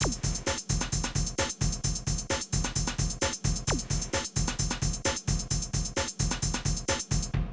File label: Drum loops, Rave & Hardcore